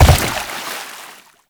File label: land water; Movement; Warframe; Uriel